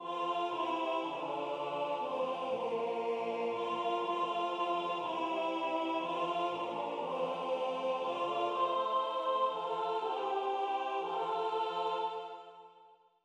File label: Contralto; Revecy venir du printans Rechant